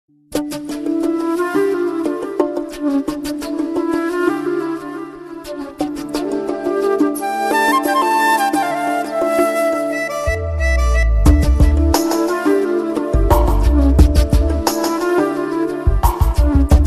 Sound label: Nice Music